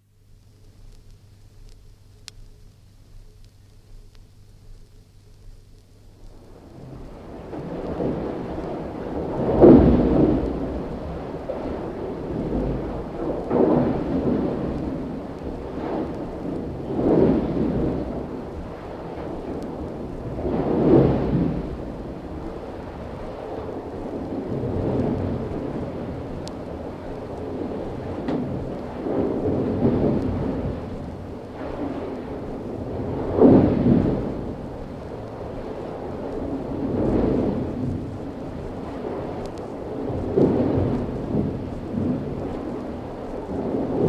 Trawler on heavy sea - sea breaking in boat (From 78 disc); Ocean; BBC